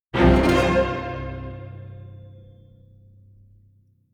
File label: Orchestral Hit